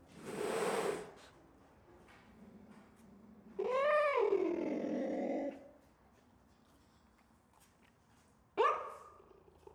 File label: Leopard